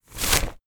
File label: close paper quest log ui crinkle; empire game settlement; map menu